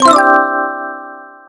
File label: boost